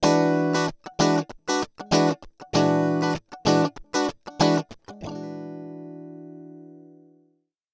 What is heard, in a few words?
gt dry